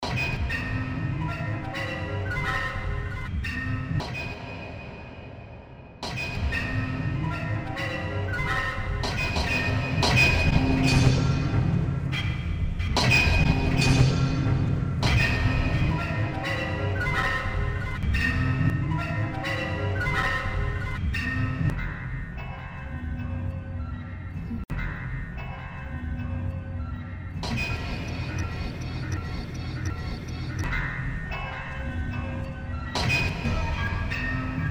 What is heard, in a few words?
Distorted Piano